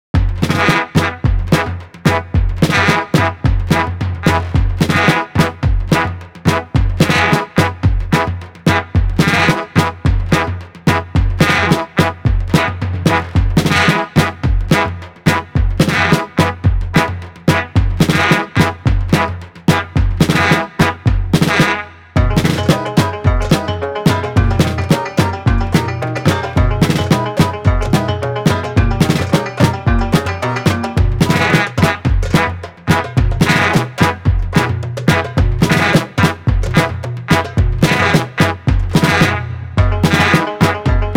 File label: Underscore, Balkan Brass; Narrative Mind